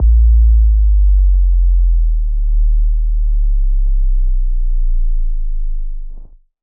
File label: One Shot Pure Sub Boom